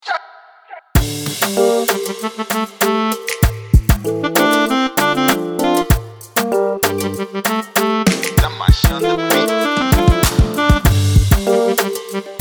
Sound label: Freebeat Afrobeat; Prod By Damashee